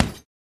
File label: Boxing Bag Hit Shrt Ha